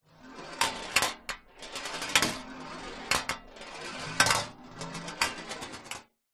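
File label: leisure marble roll on metal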